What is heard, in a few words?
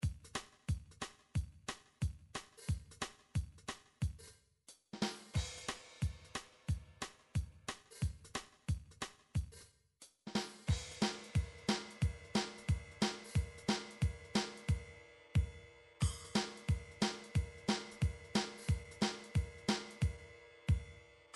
drum midi foxtrot